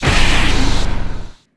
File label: launch; Quantum Redshift